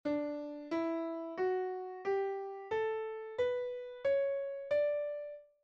D major scale

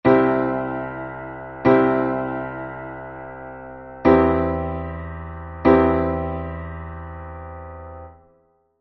C on E chord

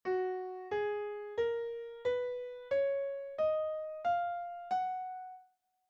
Gb major scale

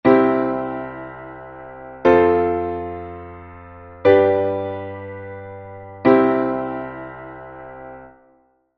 C major three chord

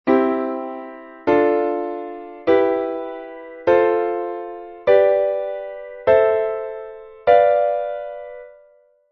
c major diatonic

